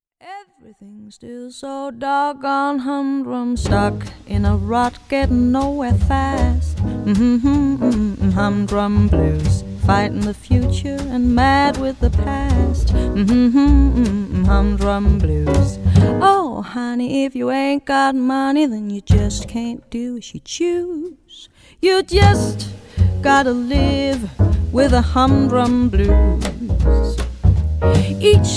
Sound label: Humdrum Blues